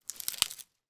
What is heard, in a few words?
impact wood branch snap on knee